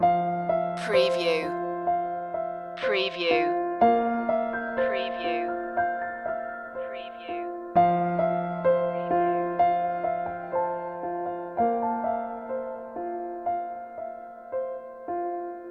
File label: soft surrender loop